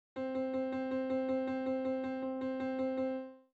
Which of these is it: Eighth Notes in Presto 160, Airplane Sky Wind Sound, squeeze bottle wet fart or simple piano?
Eighth Notes in Presto 160